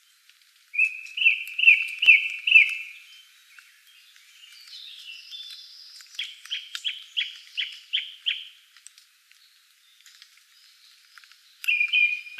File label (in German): Singdrossel; Ornithologie